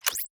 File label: Drone Ability Use; TANK; 3Souls